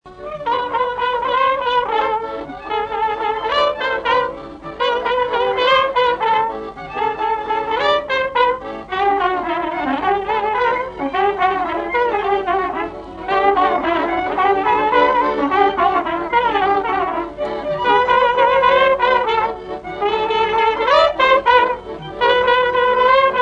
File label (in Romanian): Coasa la fanfara, jocuri si cantece batranesti; Vaslui